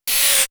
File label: Spinner